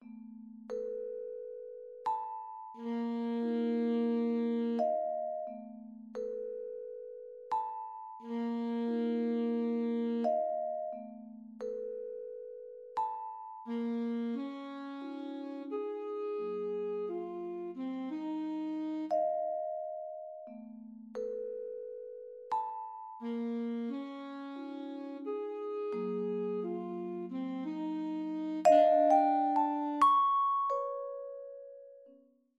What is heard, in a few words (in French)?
saxophone vibraphone